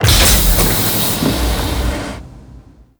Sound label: Blast Door Open; Ambience; Warframe; TheNewWar; Zariman